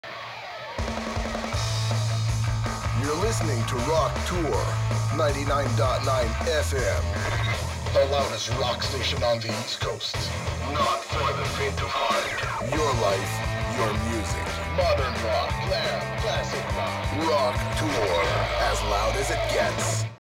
Radio Rock Jingle; Gangstar Miami Vindication; Android; macOS

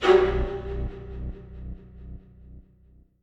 mus sting string, Minecraft Story Mode soundtrack; A Portal To Mystery

